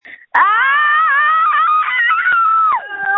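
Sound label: Scream, phone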